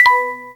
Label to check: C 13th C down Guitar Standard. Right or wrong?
Wrong